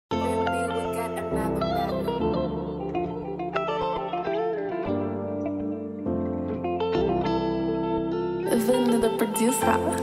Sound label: Afrobeat Type Beat